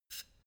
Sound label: pneumatic pump light short